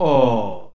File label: ooh